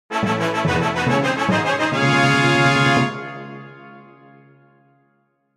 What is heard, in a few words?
fanfare